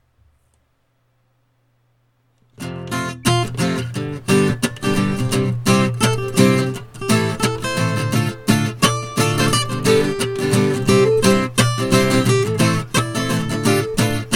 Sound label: Night Jam